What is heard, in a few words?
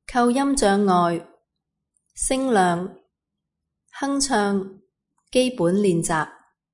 humming basic; aphasia